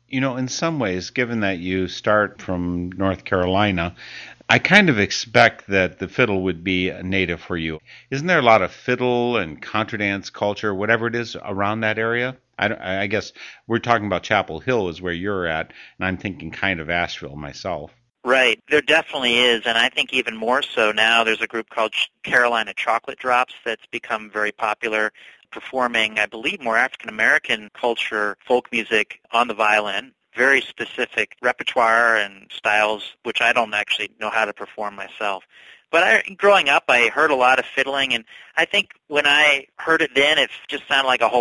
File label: fiddle